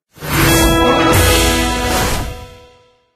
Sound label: freestart; slot; ROMAN; SubGame